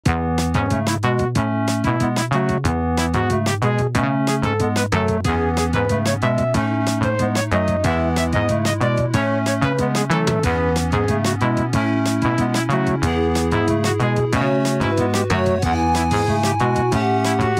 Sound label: Flutes March